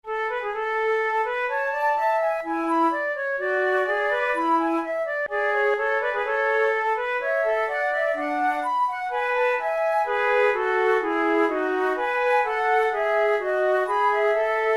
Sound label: sonata for two flutes; in a major; andante